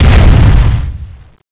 revenant attack; cstrike; npc revenant; revenant zbs

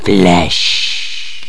flesh; brainbread

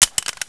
handgun clip out; svencoop

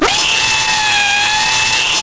reeee; svencoop

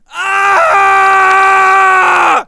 knife; dod

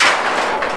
bust metal; gearbox; debris

